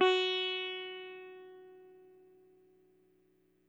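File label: electric sitar soft; Quantum Leap - Guitar & Bass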